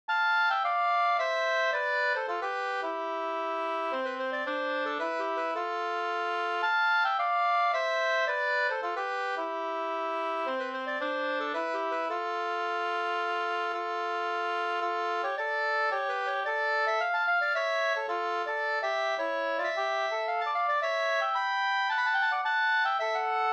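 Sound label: polska, two-part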